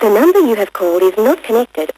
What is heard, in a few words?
Vocal Phrases; Vengeance Samples; Vocals